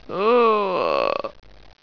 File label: mummy pain